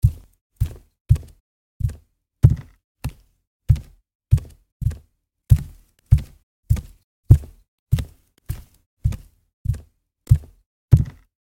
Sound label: Footsteps on wooden floor barefoot fast walk